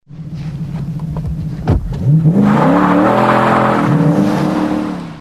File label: TVR Chimaera 5,0; biler